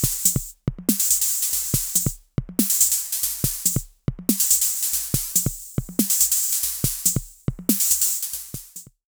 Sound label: Sequence SD und HH Dec Mod HH Tune Mod